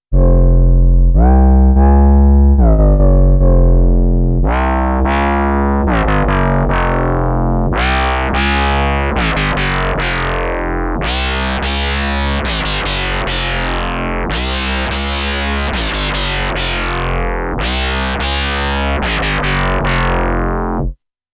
Korg, Sine Foldback; Corrosion

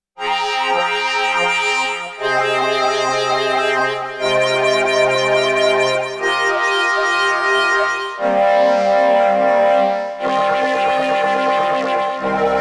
KORG Odds Stochastic Cross Modulation

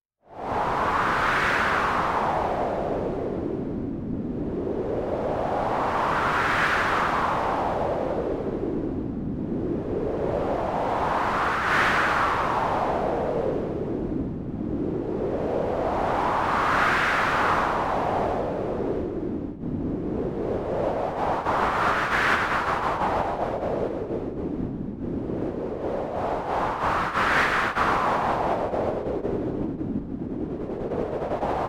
White Noise, Korg Drift

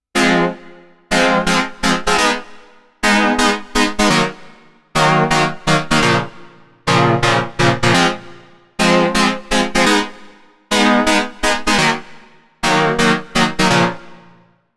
Violin Stab; KORG Tube

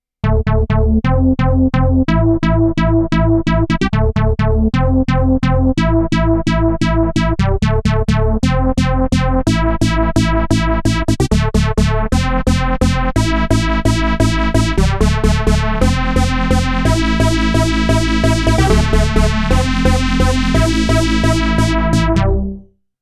KORG Node Tekno

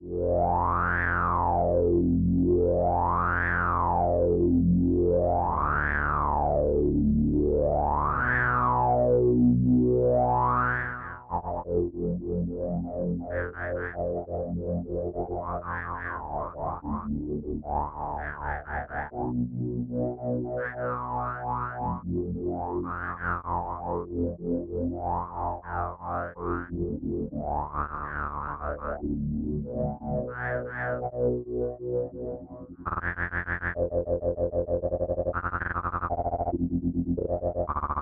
Rerun Modulated Synth

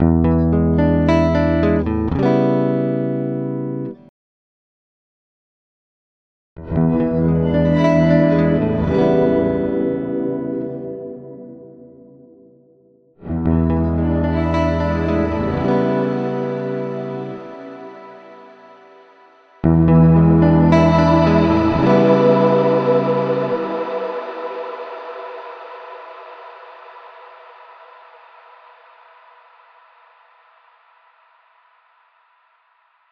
Electric Guitar

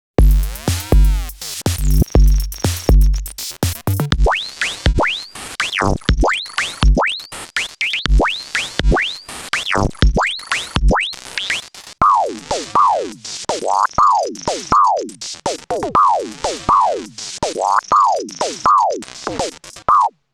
Drum Beat; Korg Ring

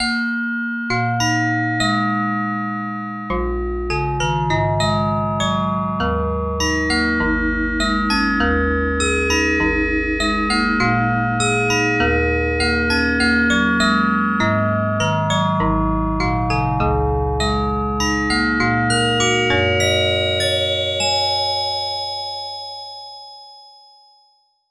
Gamelan Bell, KORG Node

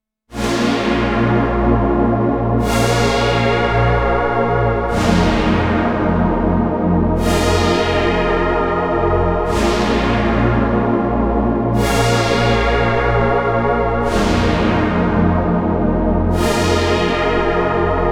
Ocean PWM, korg; dense

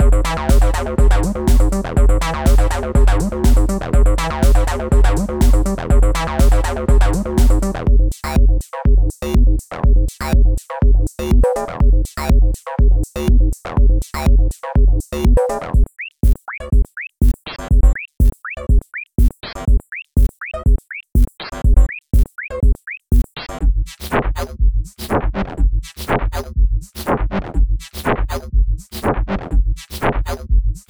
Acid Loop; Sequential

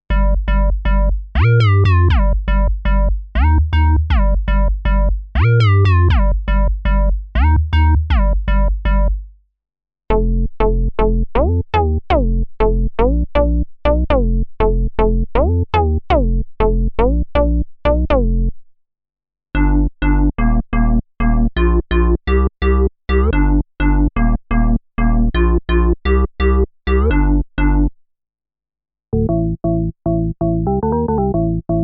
Bass Presets; Torsion

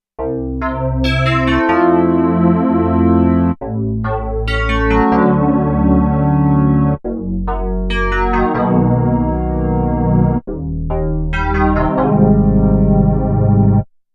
KORG Odds Static FM

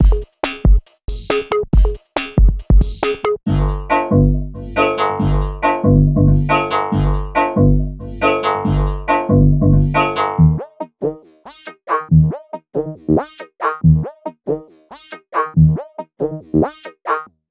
Hexonator on Electro Break

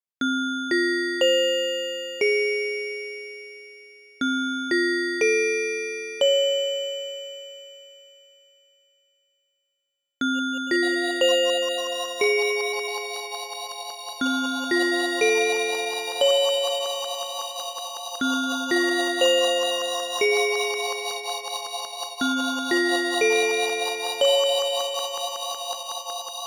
Spooky Bell; Albedo

